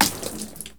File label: Blood Splat; SFX Kit; Foley - Misc